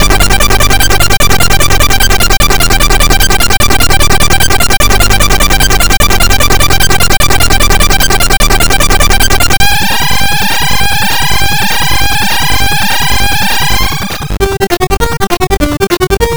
c64 in game; human race

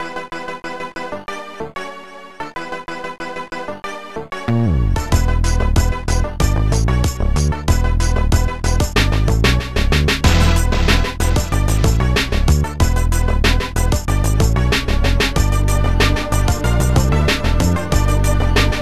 beat box, amiga; pinball dreams

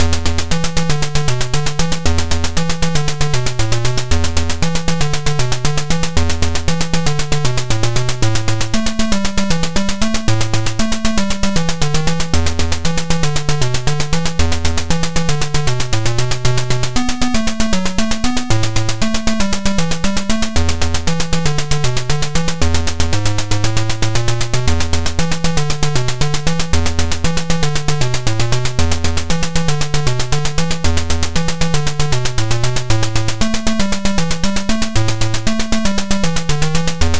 in-game background music; arcade; moon patrol

